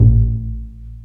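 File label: String, contrabass pizzicato; Roland - String Master Series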